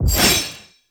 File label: Glyphball Aim; Duviri; Glyph Puzzle